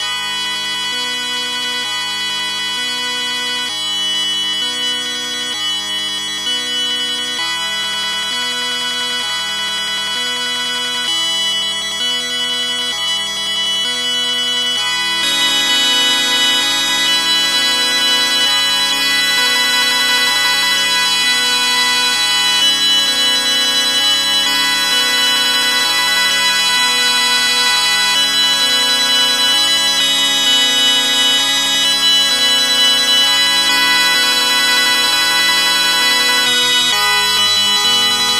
Loop G# 130 bpm; 99Sounds; Mangling Audio; Mangled Software; Midiception

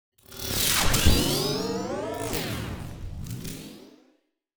RIPS AND TEARS DESIGNED Robot Arm Rotation; Rips and Tears v.2

